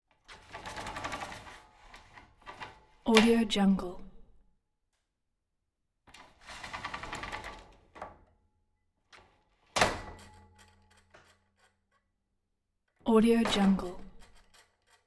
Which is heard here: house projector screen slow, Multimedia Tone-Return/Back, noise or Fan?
house projector screen slow